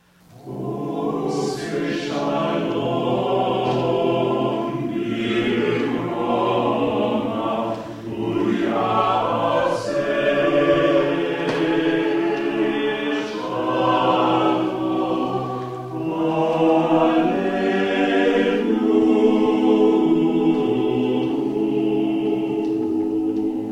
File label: choir